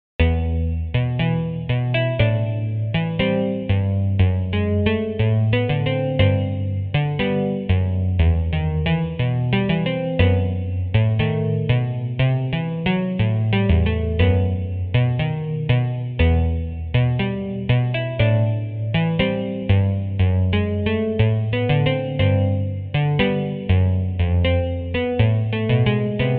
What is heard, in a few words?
fado Juno 60